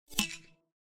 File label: Disconnect; Audio themes